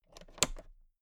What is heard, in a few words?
toolbox latch close soft